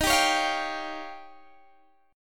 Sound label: D sharp 9th D sharp, Guitar Open D